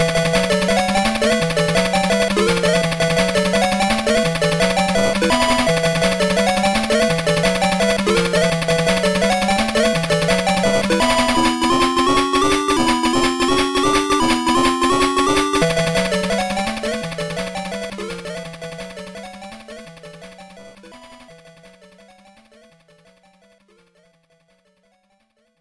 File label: Music Invincibility; Sonic Chaos; SMS